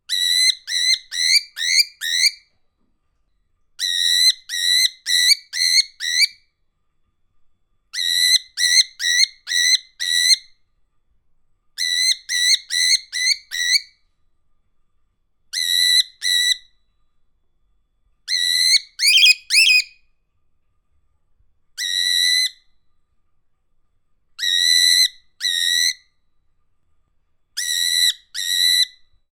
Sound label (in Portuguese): som de periquito